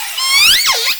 S 12 (J pod), OrcaCalls, SRKW; Alwin

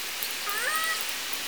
call, Orca; SRKW; Alwin

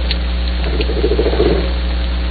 croak, humpbacks haro strait

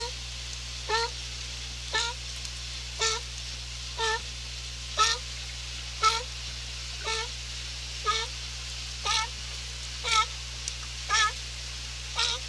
CA sea lion, biophony; bush point

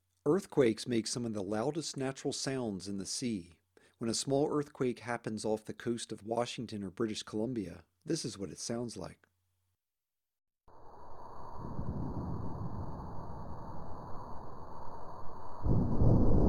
earthquake, Salish Sea sounds; onc

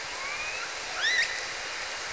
call16 (L pod), SRKW, Orca Calls

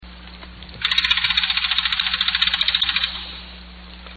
jack hammer

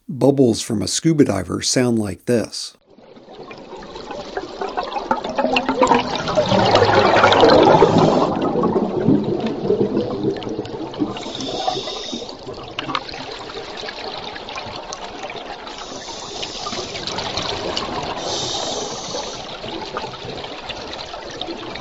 scuba narrated

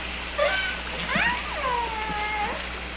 Biggs call, orcas; humpbacks inland WA BC

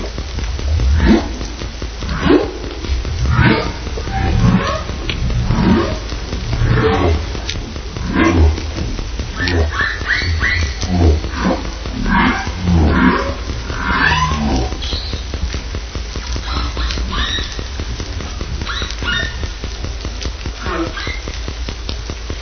HB singing, humpbacks; inland WA BC

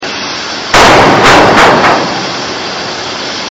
bang elliott bay, anthropogenic; salish sea data examples; signals

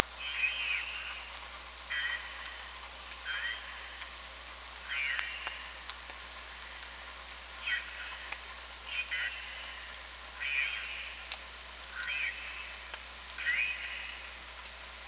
SONAR voice

